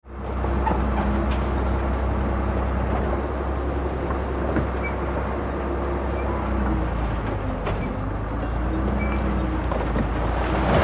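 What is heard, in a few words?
bulldozer destroys big stone wall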